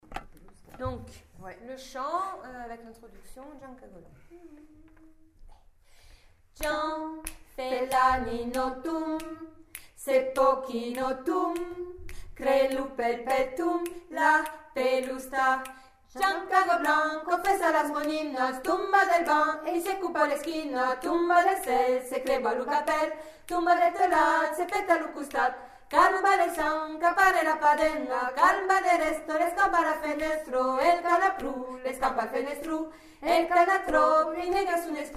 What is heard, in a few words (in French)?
voix haute